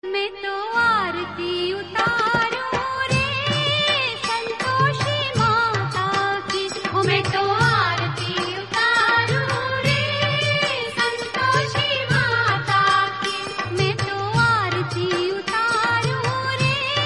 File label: Bhajan Song; ringtones